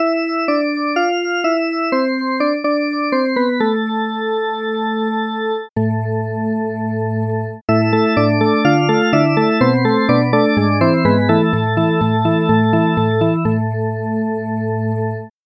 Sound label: drawbar organ